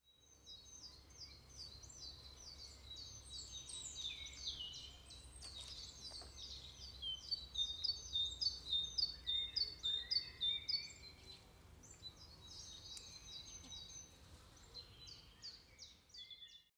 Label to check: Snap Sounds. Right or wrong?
Right